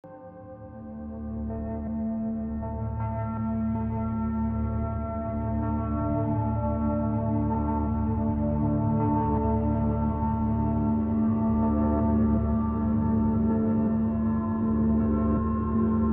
Leaving Start Location, Music

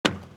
Footstep Metal; Footsteps

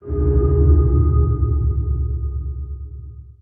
Ambient

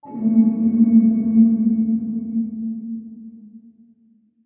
Sonar Ping Far; Command

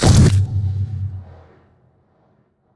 Implode; Damage

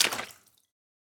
Footstep Water; Footsteps

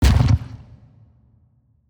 organs; Thalamus; CARRIER

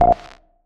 Radio Msg; UI

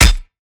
damage guardian pod impact; Alien

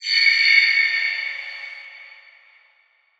cell Attack; Leucocyte; CARRIER

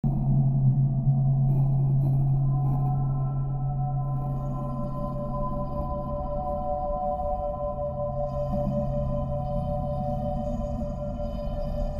Layer - Submarine Flooded, Music

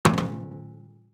Footstep Armor Heavy; Footsteps, Armor

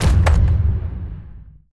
WEAPONS Flak Gun

